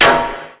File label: hit metal